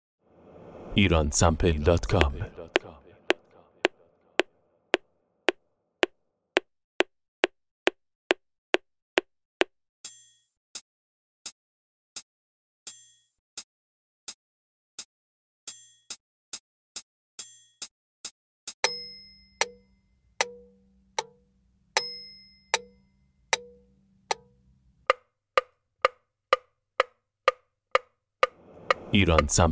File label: metronome